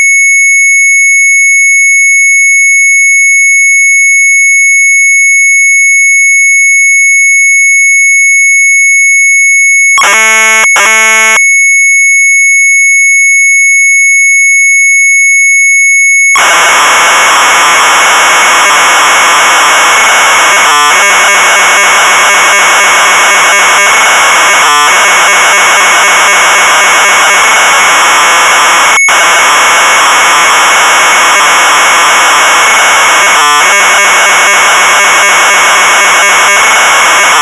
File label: Sharp MZ800 Tape